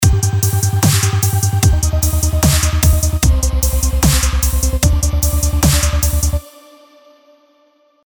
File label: Dubstep Electro; Nexus 3; Dubstep Electro 4; Happiness